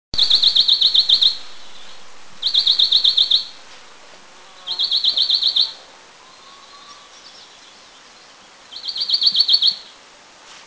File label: Green Shrike Babbler; Eaglenest; India